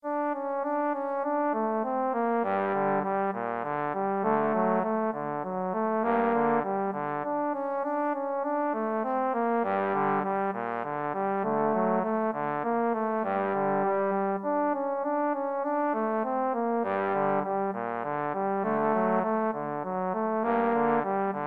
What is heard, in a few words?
beethoven fur elise, trombone duet